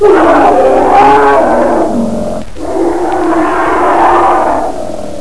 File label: bear; matchem